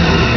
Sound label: Vehicle Speedboat Engine; Playable Tutorial Level; TR2